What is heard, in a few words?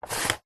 foley plastic dial turn short